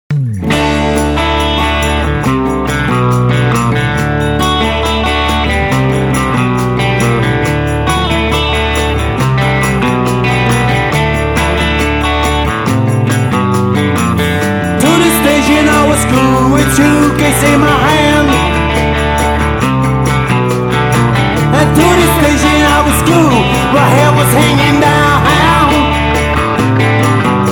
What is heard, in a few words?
blues